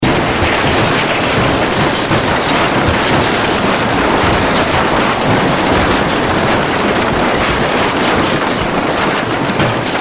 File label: Crash Roll Metal Glass Debris Large Object; Crash Roll Metal Glass Debris Large Object Long; Blastwave